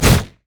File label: seed step on; Zyra; LoL